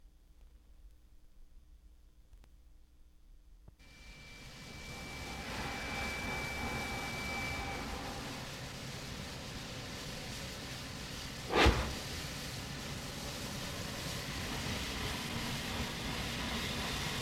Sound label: Steam Locomotive; Jac Holzman; Authentic Sound Effects Volume 2